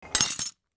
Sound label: glass